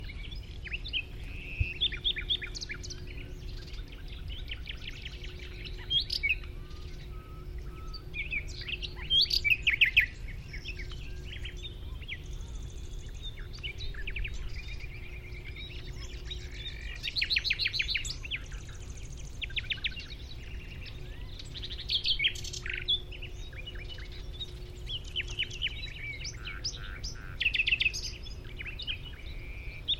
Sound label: luscinia megarhynchos